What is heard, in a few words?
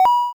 Pickup Coin